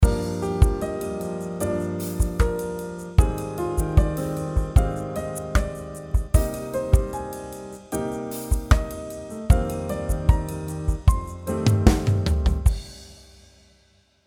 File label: harm variation; develop your musical ideas